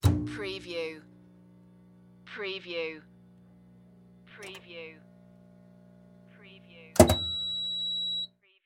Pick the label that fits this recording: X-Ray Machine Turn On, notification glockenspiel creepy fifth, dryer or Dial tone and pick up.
X-Ray Machine Turn On